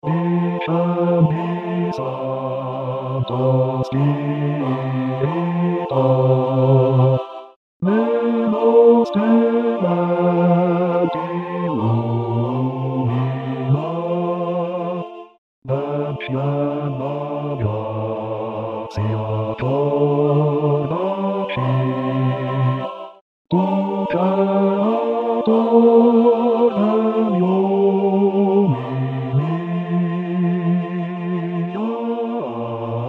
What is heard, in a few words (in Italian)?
bassi discendi santo spirito